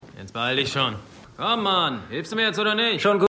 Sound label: Banjo; Fargo